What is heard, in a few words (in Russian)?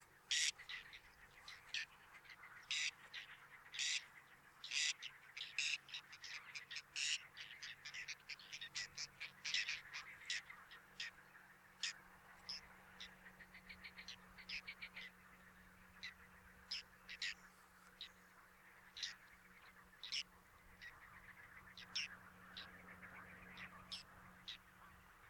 beloschekaya krachka golos